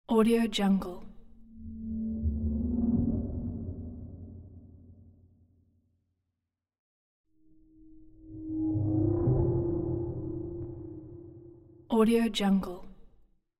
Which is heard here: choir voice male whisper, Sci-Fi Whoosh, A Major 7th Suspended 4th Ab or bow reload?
Sci-Fi Whoosh